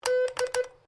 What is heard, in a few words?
MORSE CODE D; Morse code aplphabete; Morse Code (Sound Effects:Electronics)